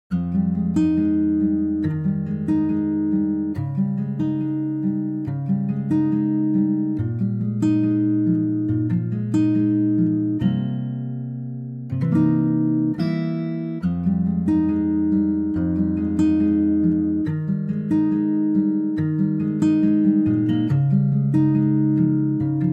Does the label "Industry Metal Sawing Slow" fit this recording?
No